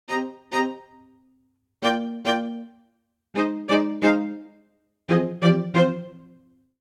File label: Ostinato Mischievous C Minor 138 bpm, string quartet; i-VII-VI-III; MIDI Clips; Ableton; Spitfire Audio